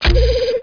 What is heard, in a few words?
sound activate; MOTS